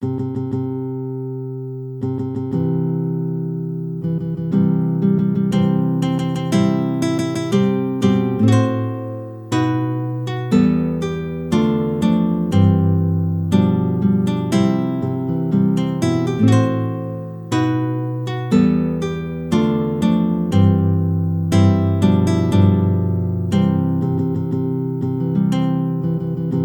wedding march; mendelssohn bartholdy felix

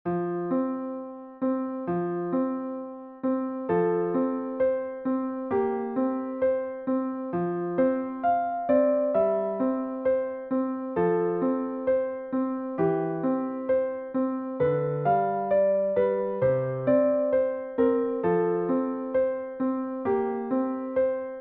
lullaby berceuse wiegenlied; zilcher paul

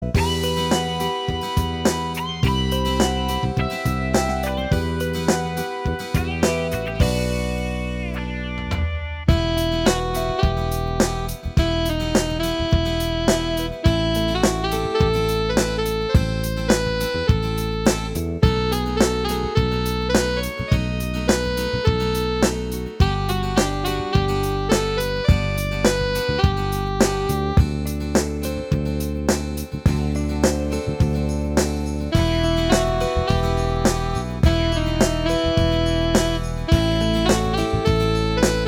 pop rock

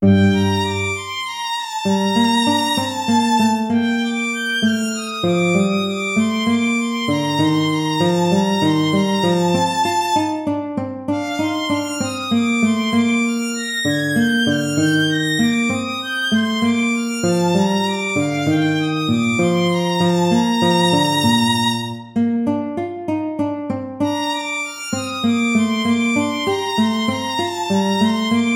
gigue

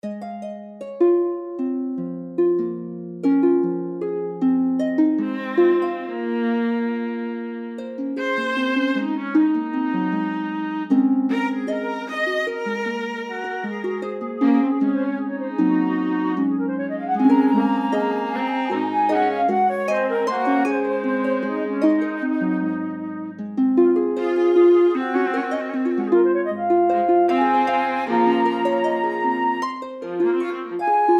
trio for flute viola and harp; rombouts patrik

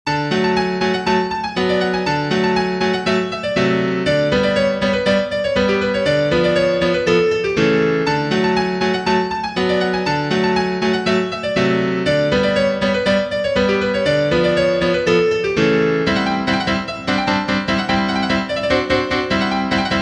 danza rumena fast dance

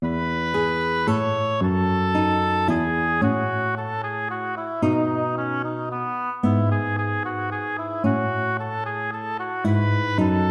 minuet major

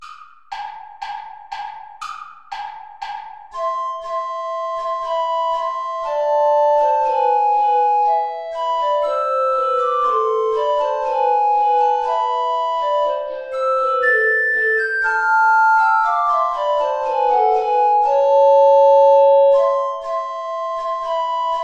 play along without guitar; hilton john; you lovers that have loves astray

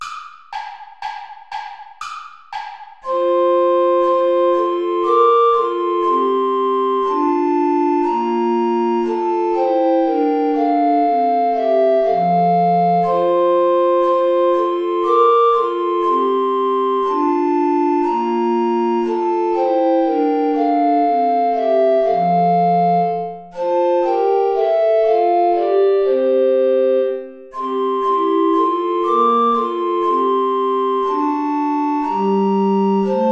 play along without alto recorder; praetorius michael ist ein ros entsprungen

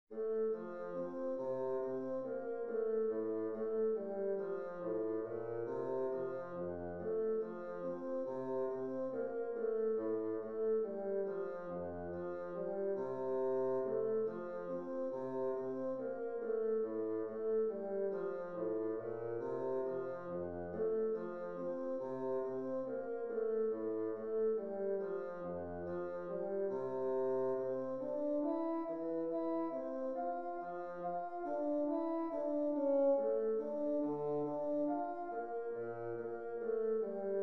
gavotte; telemann georg philipp

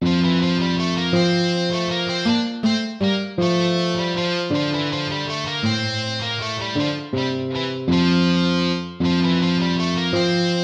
piece for lute

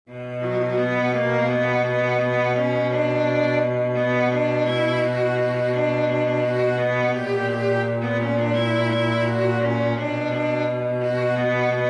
oboe strings; bach johann sebastian; evangelista begab sich aber der zeit

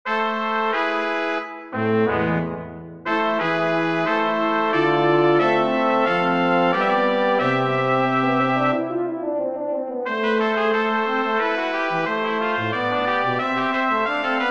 for brass quartet; bach johann sebastian; chorale herr gott nun schleua den himmel auf

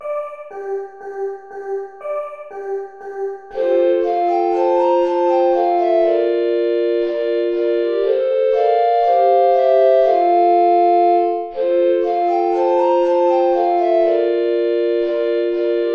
play along without bass recorder; steuerlein johann; mit lieb bin ich umfangen

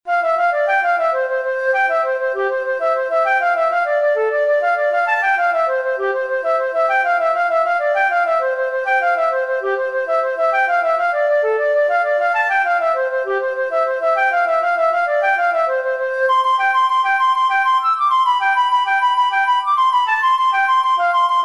jig for flute oboe harp; the irish washerwoman

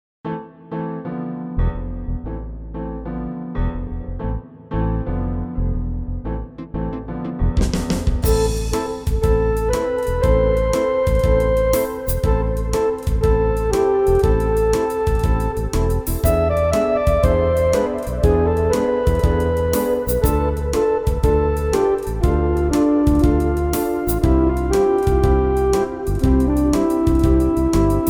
pop shuffle

